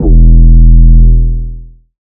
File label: 808 Bass Trip E3; Slate 808 Deep Dive; SD Signature 808s